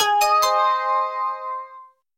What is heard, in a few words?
Quiet Alert